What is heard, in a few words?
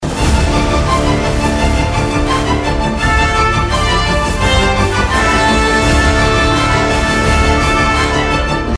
melam kottuda music; tamil; aramm